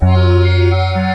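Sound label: accord guitar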